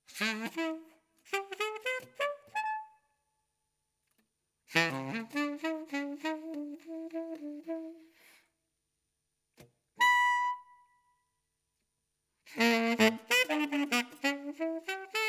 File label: SAX SOLO